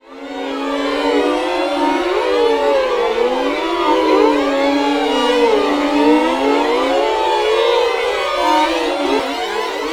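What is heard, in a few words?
VLN SLOW SLIDE, Violin; Q Up Arts; Denny Jaeger Master Violin Library; PERFORMANCE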